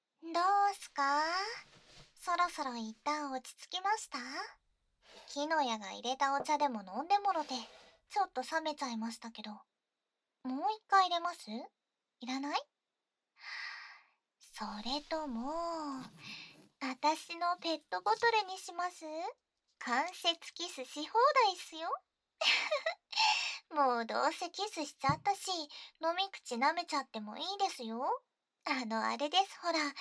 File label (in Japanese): 耳舐めしながらしこしこ